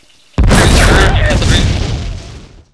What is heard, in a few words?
death; Alien Arena; droideka